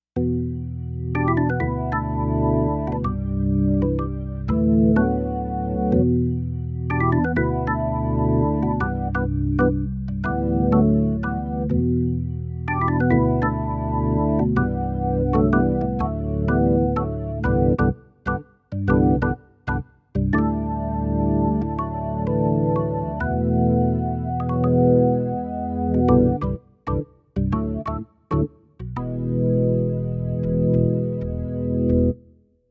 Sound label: perc organ; Perc Organ 1; JUNO-Di Patch Demo